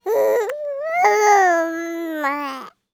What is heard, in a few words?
baby talk pleasant